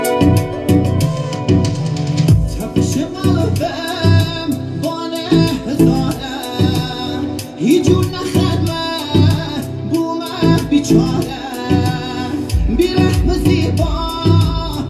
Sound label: kordi